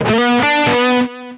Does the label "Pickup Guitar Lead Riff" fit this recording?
Yes